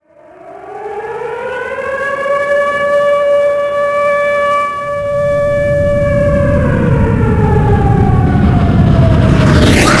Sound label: nuke incoming; weapons; svencoop